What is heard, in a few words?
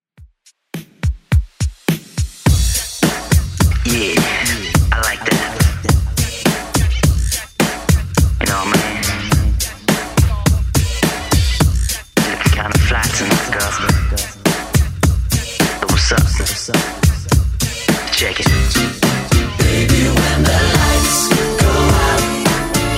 90s, 105bpm; Redrum